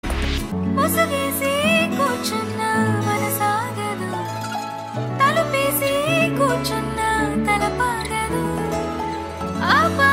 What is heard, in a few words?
Female Version Ringtone; Telugu